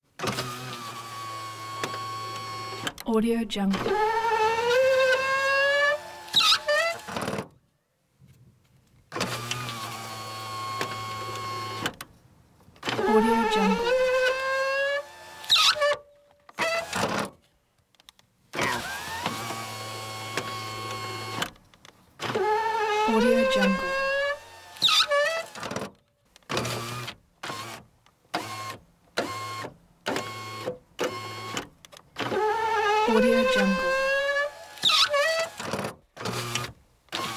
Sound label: robot servo